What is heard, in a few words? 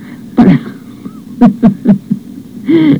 Robotz Cerrar